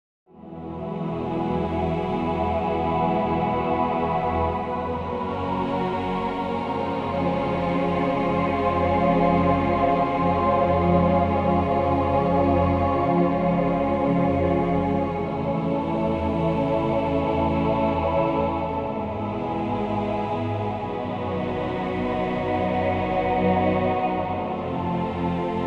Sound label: Choir Example; Nexus